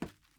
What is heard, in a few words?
wood step